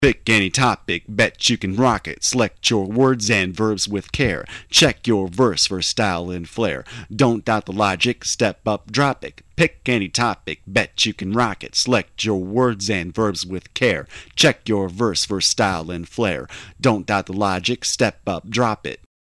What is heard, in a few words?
Bridge 100 BPM; Pick Any Topic